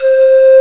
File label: Pan Flute